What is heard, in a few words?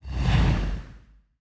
wind attack; betsy